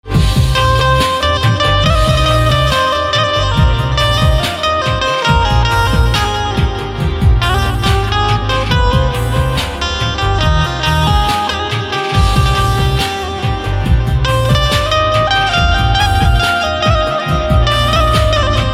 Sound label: Banjo Instrumental Ringtone